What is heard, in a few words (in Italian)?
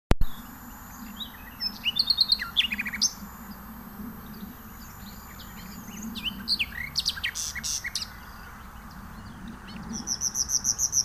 usignolo